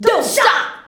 VOX VOX; Sound & Vision - Gigapack II CD 2

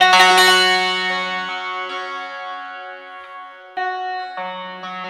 surmandal, stringed instruments; Sonic Foundry (Sony Creative Software); World Pop

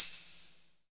Percussion Tambourine

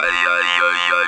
"ay ya ya", Talk Box; E-MU Producer Series Vol. 8 – Platinum Phatt

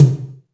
POW TOM, ACOUST TOMS; Greytsounds

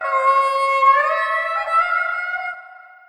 VIOLIN FX

